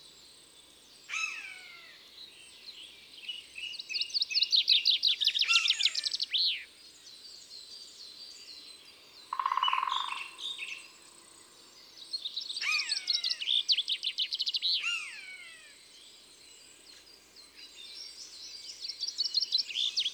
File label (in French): Buse variable; Isere; Concerts naturels de l'Isere; Studio Les Trois Becs